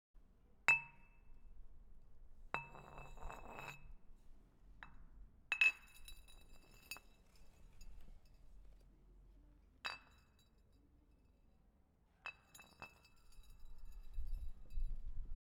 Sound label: Glass Bottle Rolling